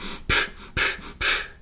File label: cyclic breaths